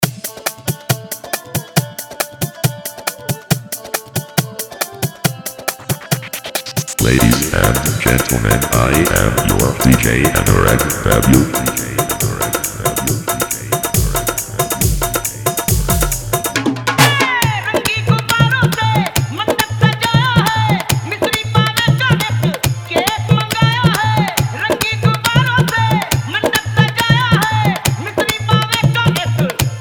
Happy Birth Day To You Birthday Hard Dhollki Bass Mix